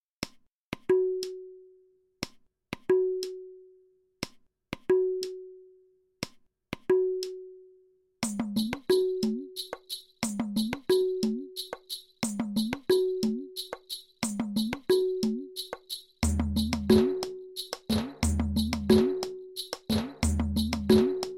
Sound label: folk beats